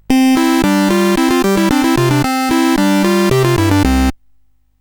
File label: sid CSG8580R5; Jumpman Junior